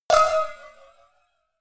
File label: cowbell clank hard